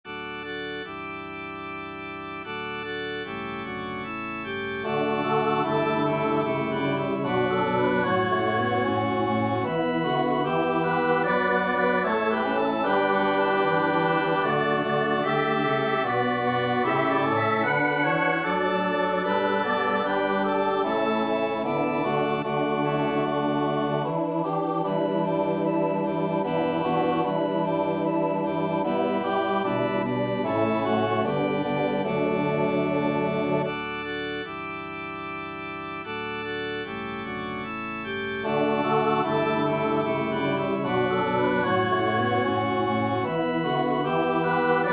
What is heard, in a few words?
christmas cradle hymn; brooks davies douglas